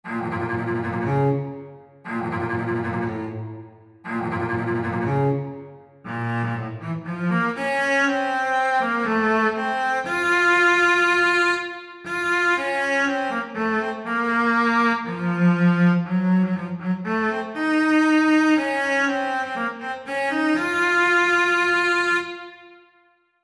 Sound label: Cello